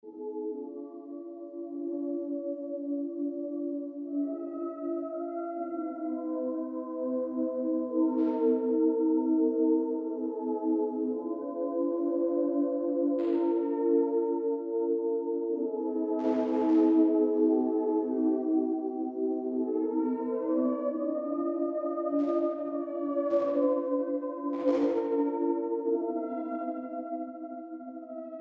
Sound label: Korg Z1 Flute